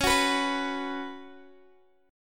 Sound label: C sharp 7th Suspended 4th C sharp, Guitar Open G